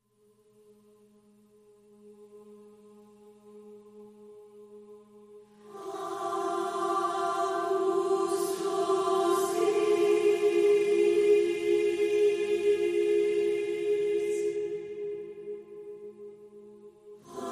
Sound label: Choir Only